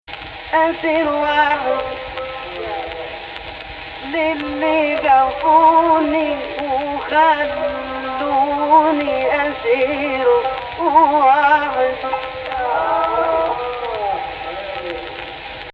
AHH, Egypt Rast; Jins Rast in the upper octave; Actual Key: Rast on C

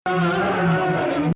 Rast Sihtu Musta3ar

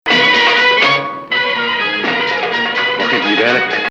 Bayati Ajam Nahawand, starts on 6; although admittedly tonicization not strong; Ajam 6 - Nahawand 4

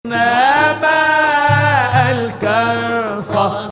Hijaz Octave

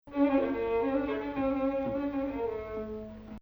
Hijaz

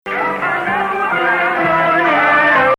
Ajam

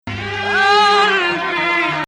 Ajam Nahawand

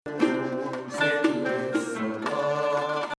Hijazkar, Kurd 1; Hence Maqam "Hijazkar-Kurd"--starts Hijazkar 8, resolves Kurd 1

